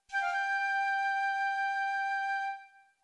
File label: Oriental Flute Sound